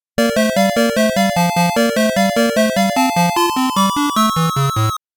Round Clear (SMS Version)

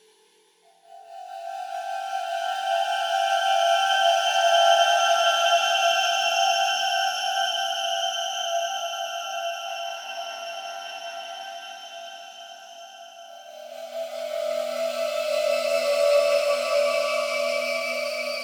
Lab Ambience; soma gamerip 2015

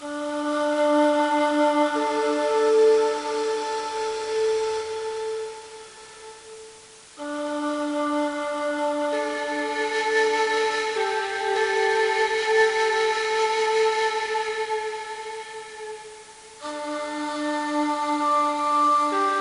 Ambiant Music; Logic Island

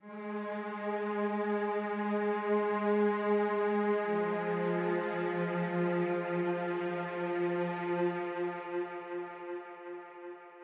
Diving Music Cello

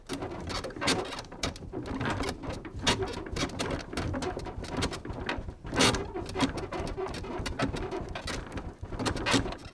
Suspension Car Squeek Bump; Lucius III

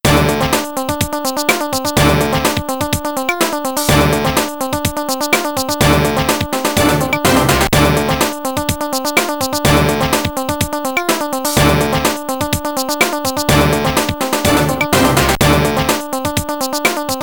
Story Sequence; Fightin' Spirit; cd32

